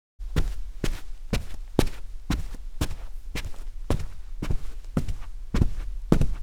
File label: Footsteps, Carpet